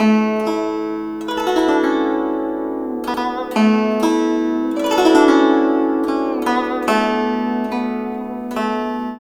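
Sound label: guzheng; Spectrasonics; Heart of Asia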